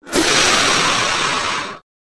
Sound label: Monster Combine, monsters